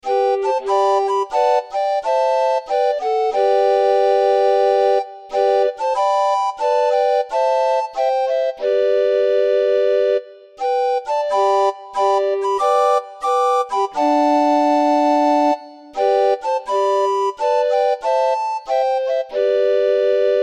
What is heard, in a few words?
The sweet by and by, orgue barbarie